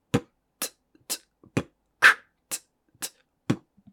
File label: Pattern, beatbox